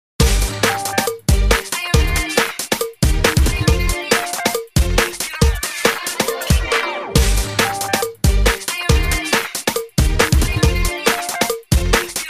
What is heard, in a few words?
HighEnergy